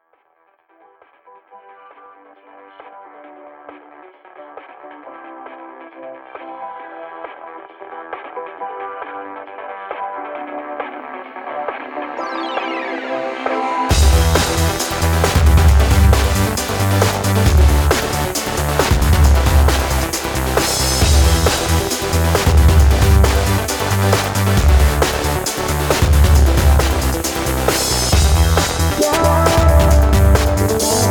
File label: breakbeat